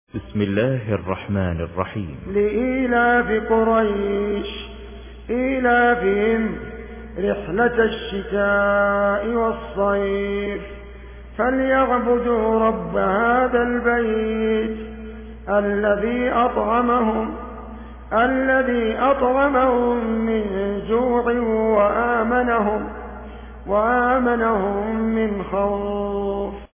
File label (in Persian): ترتیل بروایت حفص عن عاصم; سوره قریش